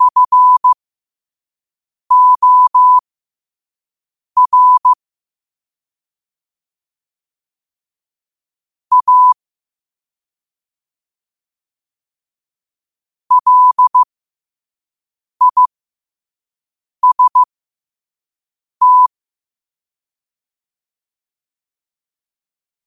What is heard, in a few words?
5 wpm, morse